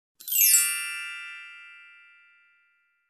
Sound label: Descending mallet glissando